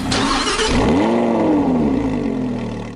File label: startup, huracan; lam huracan; vehicles cars; lwcars